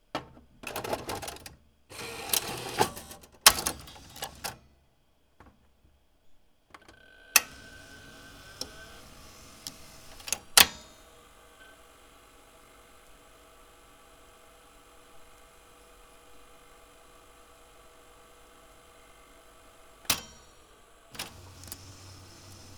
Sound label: Sony VO Tape Insert, Play and Eject; SFX; IRL Recorded